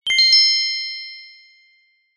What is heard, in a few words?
fantasy magic chime ping wand fairy godmother